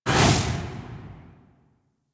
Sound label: Operator Focus Cloak Blind Burst; Warframe; Operators; Powers; Attack